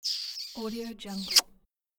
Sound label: kiss